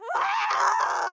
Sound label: female scream